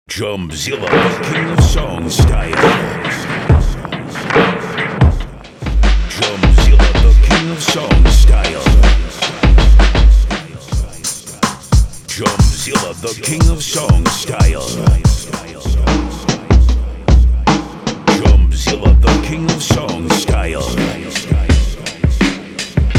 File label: DRUMKIT; SNIPS